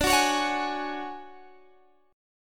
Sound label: D-Major Sharp 11th, D, Guitar, Open G